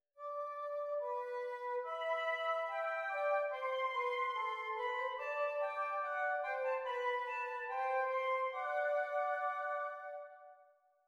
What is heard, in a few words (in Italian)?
esercizi di doppio contrappunto nella decima